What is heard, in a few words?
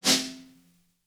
Snare Only Sastain